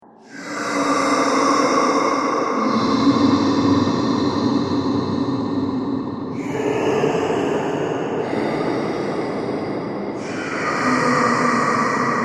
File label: Sinister Breaths Horror, Scary Breathing